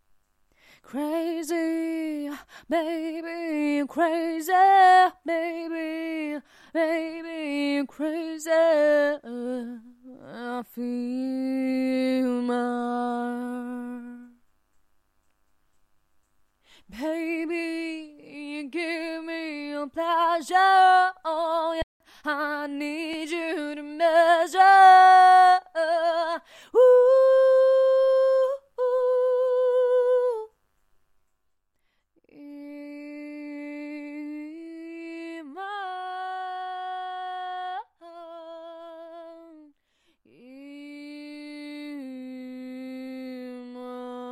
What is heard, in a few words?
acapella, Pop House 66 AM